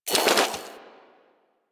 Igniter Pistol Reload; Warframe; Weapons; Corpus

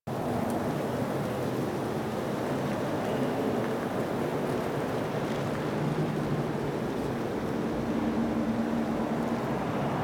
Outpost Defense Ext Amb; Warframe; Ambience; Corpus; Crp Outpost Defense Three

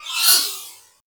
Swarm Cannon Projectile By

